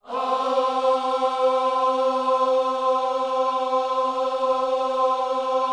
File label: OHS C 4, Gospel; Spectrasonics; Vocal Planet Vol.1 Gospel; akai